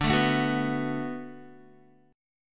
D 6th D down Guitar, chord; D6 strummed; tuning 4F,4A,5C,5G,5C,6E

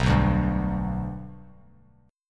B Minor Sharp 5th B Bass Standard, chord; Bm#5 strummed; 7,2,0,0; JGuitar

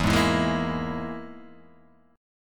E Major Flat 5th E down Guitar Half Step Down

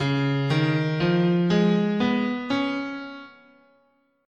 C sharp Dominant Pentatonic, scale

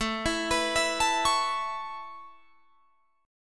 A Major Add 9th A Guitar Drop D, chord

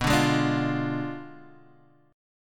B Diminished 7th B Guitar Half Step Down

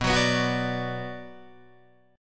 B Minor B Guitar DADGAD

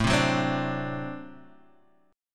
D 7th, A

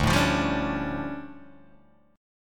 D Minor Major 11th, down, Guitar, Open G

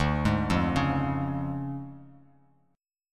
D Minor Major 7th Flat 5th D Bass Standard; 10,11,3,6; DmM7b5 arpeggiated; JGuitar

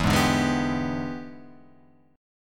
E 7th Sharp 9th, chord

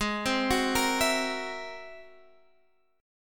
Ab Augmented 9th Ab Guitar Half Step Down

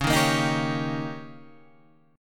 D sharp 7th C sharp, guitar

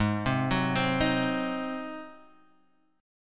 G sharp 7th G sharp, Guitar Standard; G#7; arpeggiated; 4,3,4,5,4,x; JGuitar

